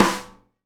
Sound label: SNARE RIM, Live Snares; E-MU Producer Series Vol. 8 – Platinum Phatt (CD 1)